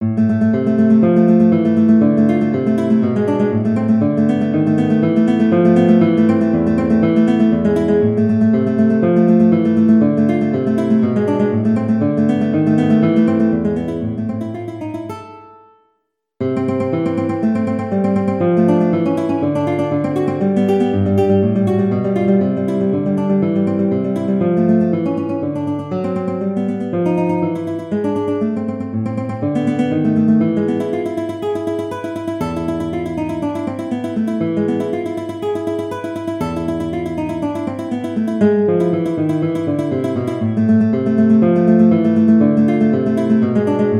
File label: Etude guitar; Carcassi; Op60 7; Dogtooth